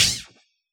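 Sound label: snare drum noisy scratch, Yamaha DJX; 155 DJX Kit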